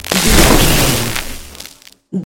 electric surge blast

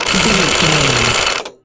gun auto barrel spin med

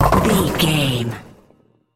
bowling ball pin strike

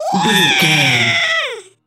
goblin fairy battle shout

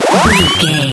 retro powerup collect

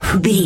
voice male breath quick

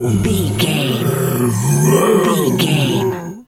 bear roar

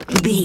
lever turn push crank handle small

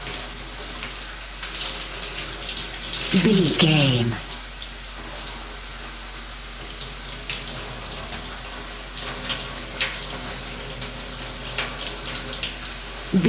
water running shower dripping loop

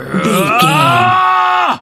voice male battle shout

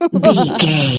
voice female laugh

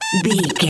voice fun creature squirrel

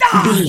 voice male hurt pain

